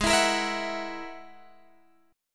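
A flat 7th Suspended 2nd Sharp 5th A flat Guitar Drop D, chord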